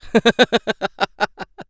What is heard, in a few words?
laugh; Eternal Return; ENG